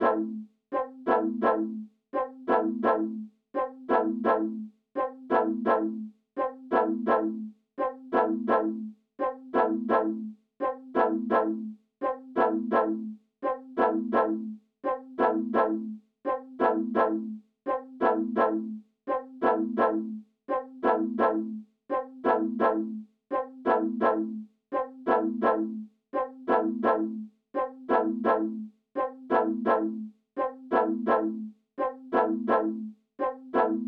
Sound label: 170 BPM A Min Juno Horn; Cymatics; 2022 Melody Collection; Revenge